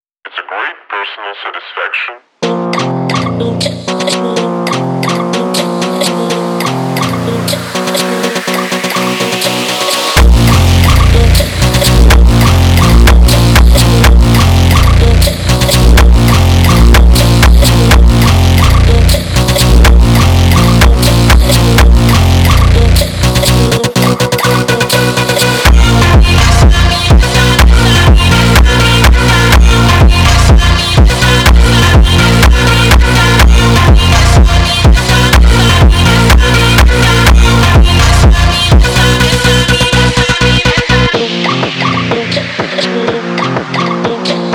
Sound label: brazilian phonk